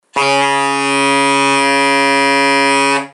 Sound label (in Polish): z oktawowa bb, saksofon